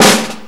Acoustic Roll

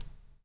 COPS STK; Spectrasonics - Backbeat

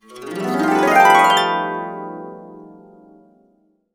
B, Harps; USB Soundscan vol.62; Complete Harps; AKAI

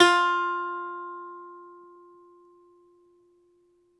BALALAIKA, Prima Balalaika, Plucked Strings

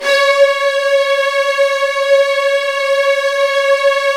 Strings C#2 R; Virtuoso

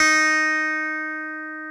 clavinet D#4; InVision Interactive; Lightware VOL-1; Instruments & Percussions

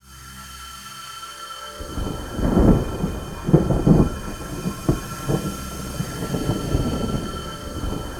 ATM TONAL; Best Service - XXL Production Effects

